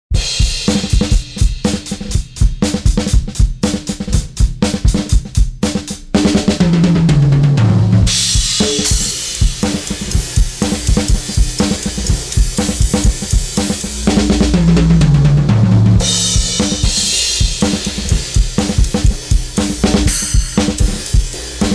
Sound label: Drums; Kelvin